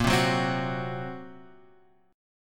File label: A sharp Suspended 2nd Sharp 5th A sharp